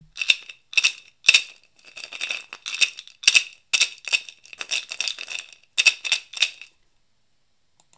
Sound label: Giraffe rattle; Hand-Crafted Instruments; Hand-Crafted Instruments from Africa, South America & South Asia; rattles